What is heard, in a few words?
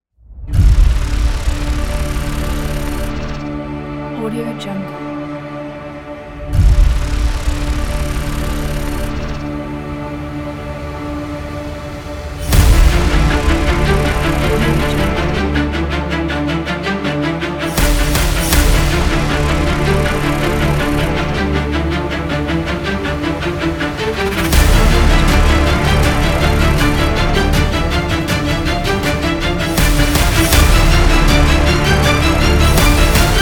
hybrid dark powerful trailer intro